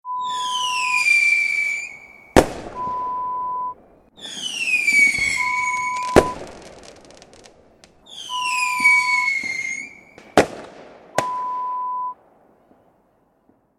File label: Rocket sounds